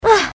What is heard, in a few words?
groan; pony; Twilight Sparkle